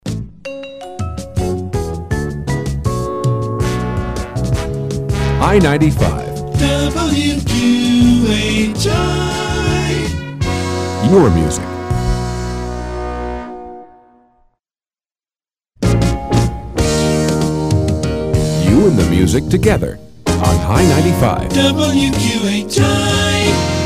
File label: Stereo Rock Liner Jingle Hybrids; WQHI